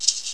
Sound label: MARACAS